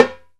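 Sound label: hi timbale center; E-MU Producer Series Vol. 2 – More Studio Essentials; Composer; Timbales Xswitch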